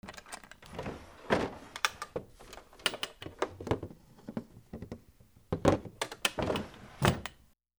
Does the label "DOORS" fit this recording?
Yes